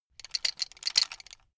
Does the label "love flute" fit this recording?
No